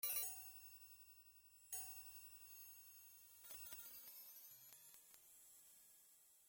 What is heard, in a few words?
War Drum; Strider; Genesis